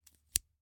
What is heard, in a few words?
Scissors, snap, snip, shear, medium, single; Eiravaein Works; Vaeyan IV